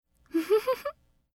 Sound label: lady laughter